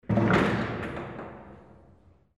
Hinged closing

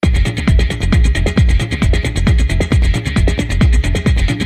Jingle Menu; Armored Core Formula Front

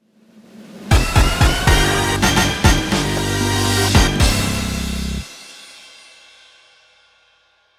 Quiz Over; paper mario the thousand year door

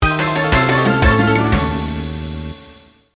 Monkey Fling Lose; bully scholarship edition

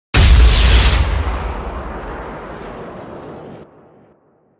Bazuka Shoote

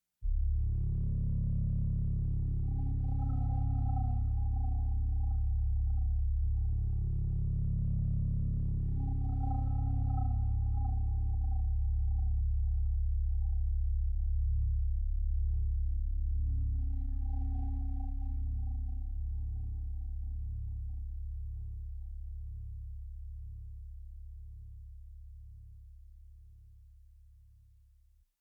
Asylum Wake Up Bass; Get Even

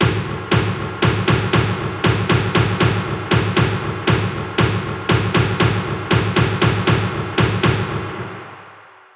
Drum; MLB Power Pros 2008

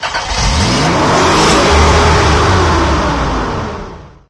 Vehicles; SFX Vehicles; Scorpion

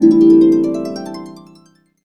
Trigger Sound Default; fate of hellas

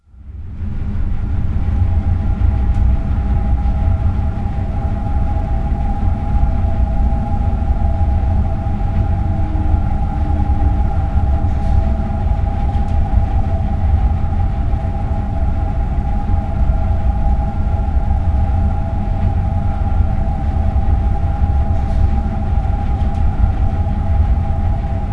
TREE PUMP AMB; windows gamerip 1998; Grim Fandango

